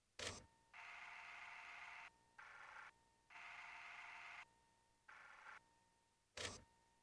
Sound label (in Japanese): ヘッドホンボリューム10 フィルタ発生片チャンネル; inateck